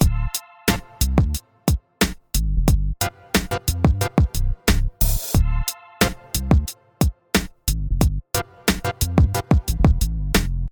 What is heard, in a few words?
Hip Hop Groove